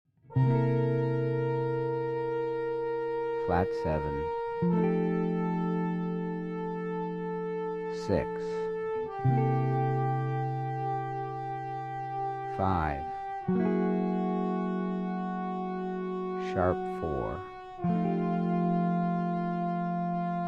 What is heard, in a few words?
C Blues Guitar